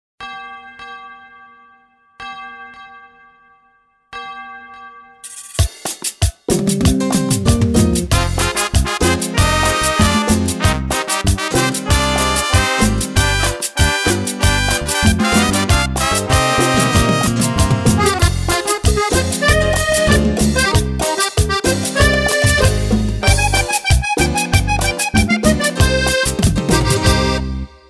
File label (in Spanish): Versión Cumbia